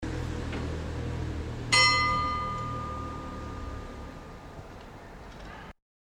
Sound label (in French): cloche; Bourg Saint Andéol